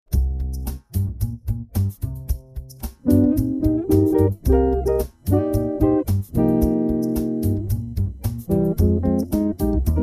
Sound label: jazz twilight